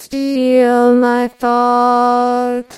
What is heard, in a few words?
singing